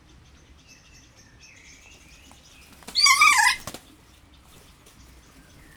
egy reppenés egy hang, kea; jaszberenyi zoo 2016

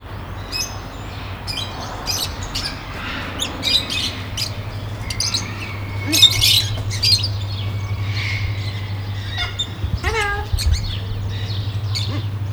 hello, sárgabóbitás kakadu; állathangok; nyíregyházi zoo 2010

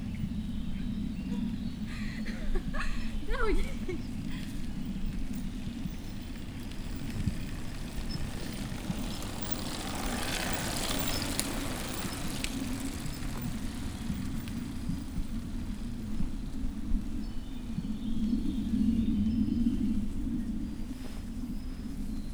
kettő lány felfelé, repülő, kerékpár túra; visegrad

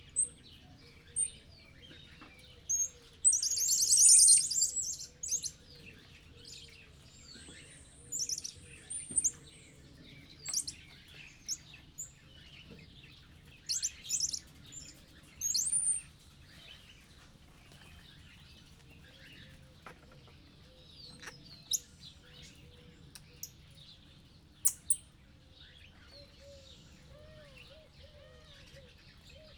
etetésre várakoznak, bolíviai mókusmajom; jászberényi zoo 2015

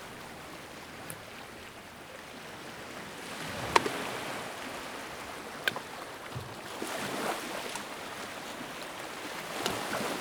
haz alatti obol, tenger thassos

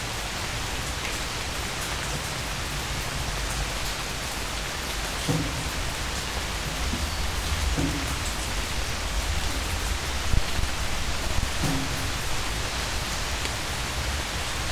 sárgafejű királyka és egy szajkó; allathangok; termeszetben; agteleki karszt

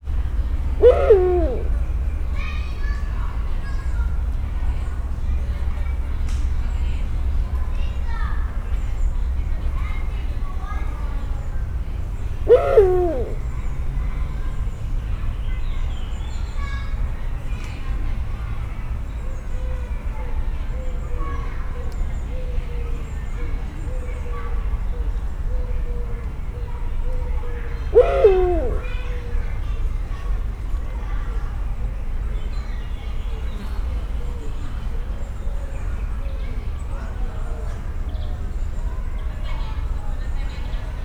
háttérben iskolások, uhu; szegedi zoo 2011

